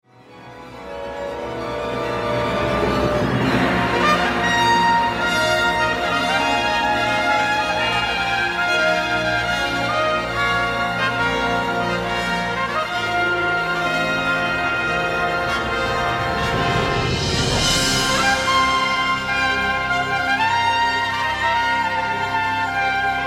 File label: Trumpet Concerto